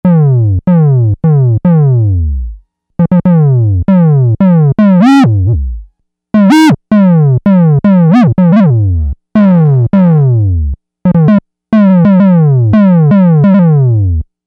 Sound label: Tom Dive